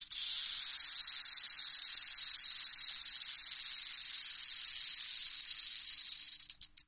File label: Rattlesnake Western